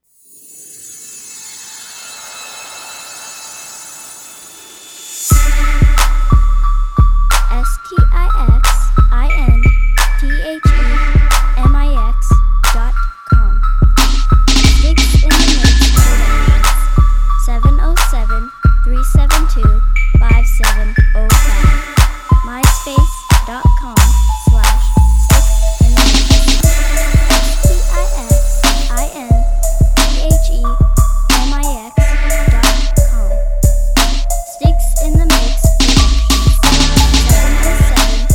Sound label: bells n whistles